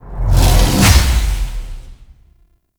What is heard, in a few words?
Hardened Wellspring Cast; Warframe; Focus; Zenurik